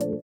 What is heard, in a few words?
VIBRA; Spectrasonics; Metamorphosis